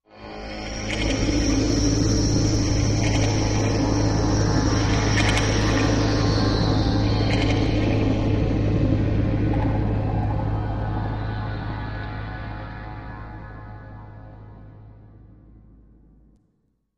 Mood tester, Machine, Ambient Chirps, Low Drone, Reverb